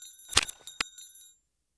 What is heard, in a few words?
pin replace